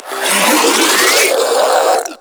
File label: Spin Scream; Warframe; Proto Infested; Ancient; Abilities